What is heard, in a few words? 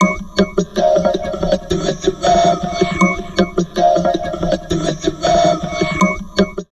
VOCOD, Drum Loops Crazy Processed; USB Soundscan vol.07; AKAI